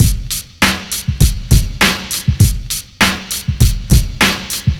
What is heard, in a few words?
Drum loops, Boombap